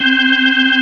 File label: B3 C 4-4